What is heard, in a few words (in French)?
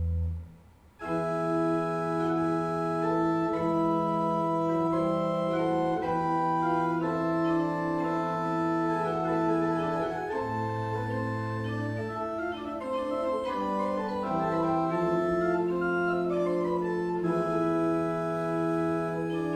morceau